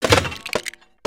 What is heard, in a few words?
Metal Object; destructibles